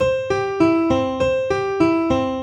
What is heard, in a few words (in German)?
C Dur absteigend